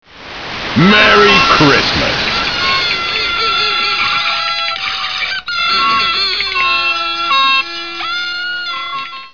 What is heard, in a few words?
gas sound